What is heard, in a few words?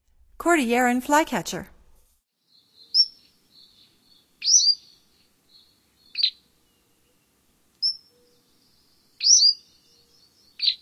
Cordilleran Flycatcher, Songs of Birds of North America; Stokes